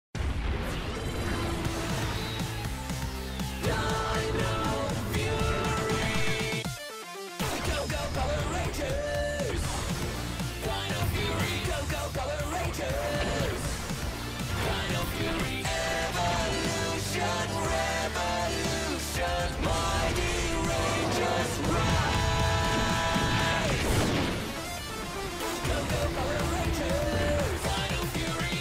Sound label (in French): musique generique